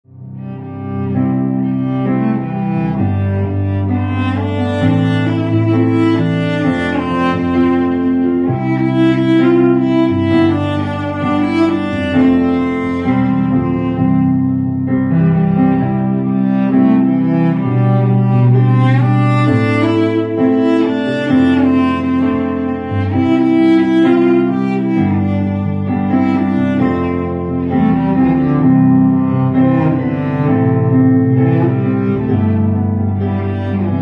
cellist; classical musicians

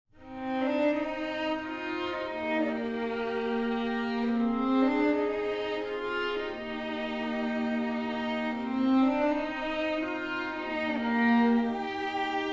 quartet; classical musicians

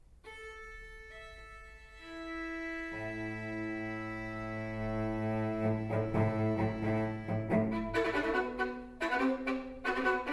strings

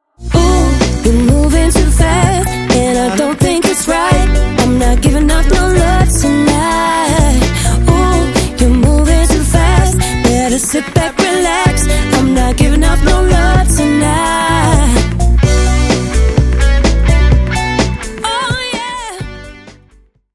duo electric

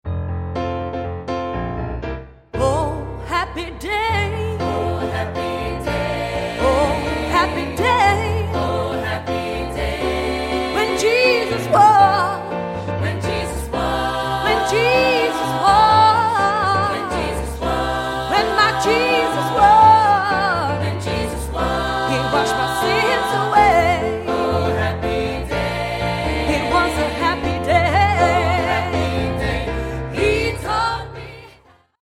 gospel choir; funky

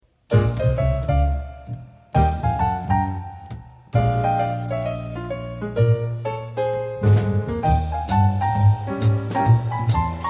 jazz lounge trio

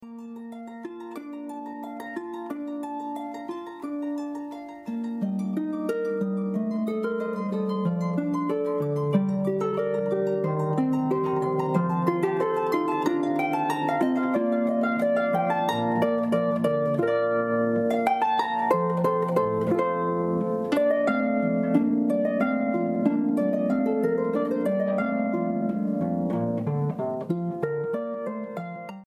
harp

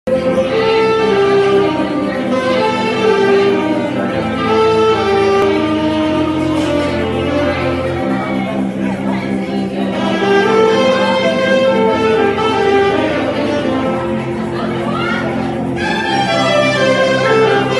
sax, live

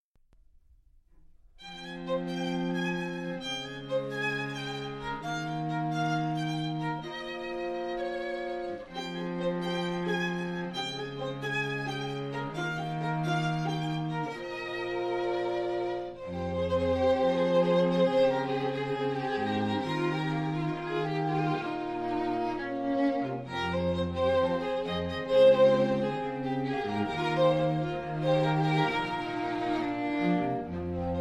strings; classical musicians

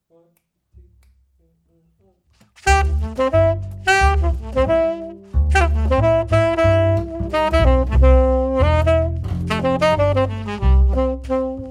trio

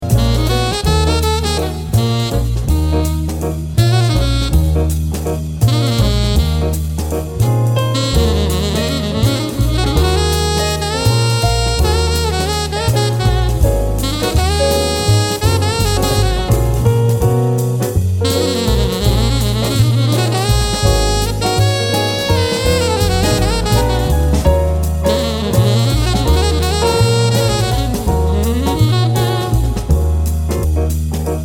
sax

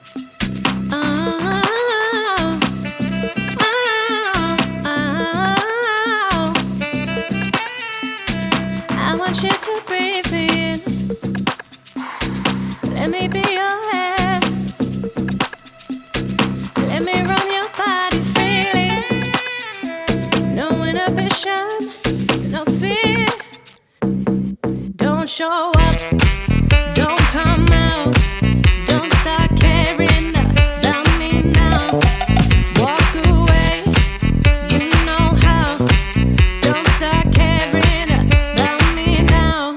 drum n decks trio

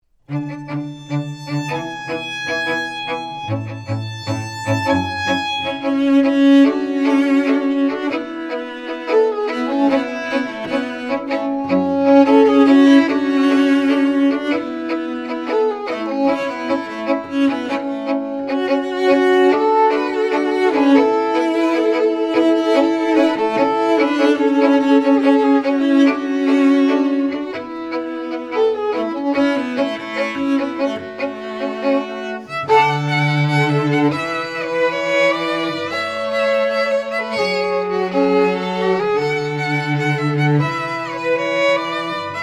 strings; classical musicians

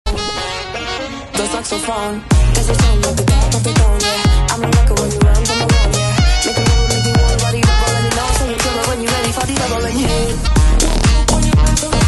sax party mix live

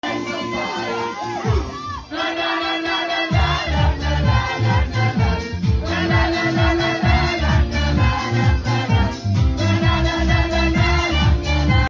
sax

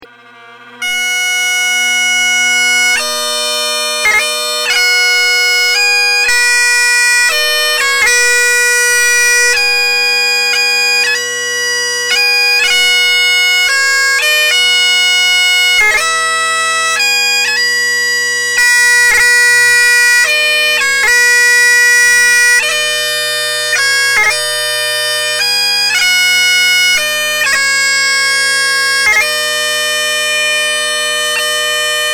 bagpiper

